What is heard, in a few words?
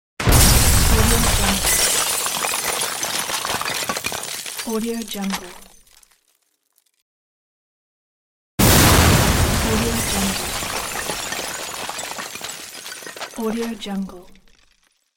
undermining the building; sounddream